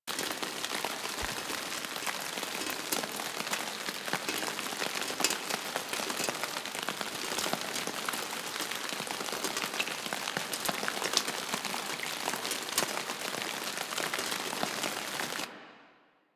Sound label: generic rain suit loop; Ambience; Props; Loops; Eidolon Plains Generic Rain Suit Loop; Warframe